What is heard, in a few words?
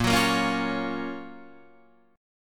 F sharp Major 7th